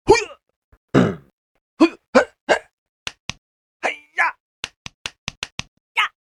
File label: Martial Arts Sounds, punch and hit sound effects; human sound effect; Andrew Fleming